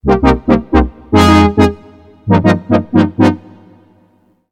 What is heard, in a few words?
brassy